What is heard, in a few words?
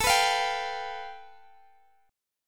A 7th Suspended 2nd Sharp 5th, Guitar, Open G; x,x,19,16,18,17